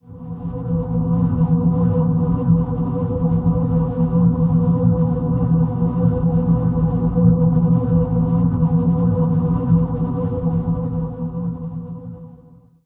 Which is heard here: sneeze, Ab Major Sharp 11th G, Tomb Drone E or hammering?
Tomb Drone E